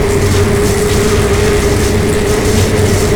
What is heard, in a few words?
Tornado Weapon Loop; Destruction